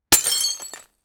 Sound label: breaking the camera lens, impacts